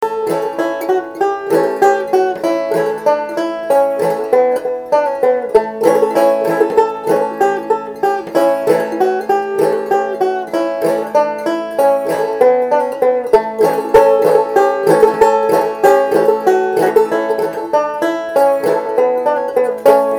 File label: 5 string, banjo